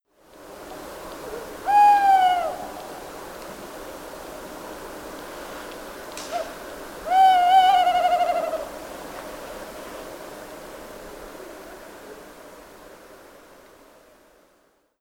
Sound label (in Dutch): Bosuil Strix aluco, fluiten; vogels